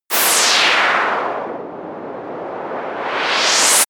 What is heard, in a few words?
white noise drop liftoff